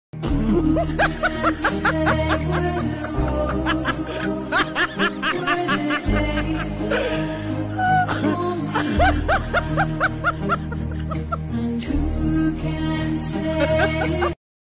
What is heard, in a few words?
Laugh BG Music; Nollywood